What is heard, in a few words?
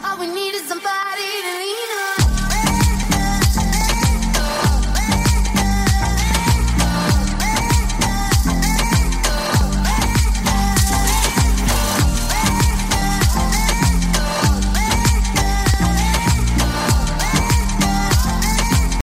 snake tone ringtone